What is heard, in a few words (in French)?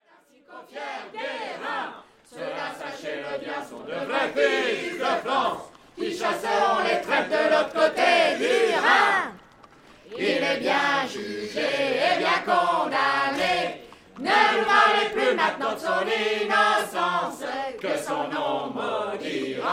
Chant de rue